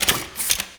Fist Equip; Warframe; Tyl Regor; Fists

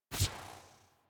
UI Theme Window Open; Warframe; Theme Zephyr

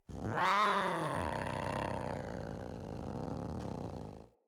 Kavat Vocal Idle; Warframes; Khora

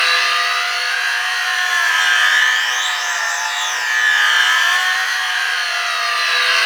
Boss Spirit Two Loop; Warframe; Cephalon Weave

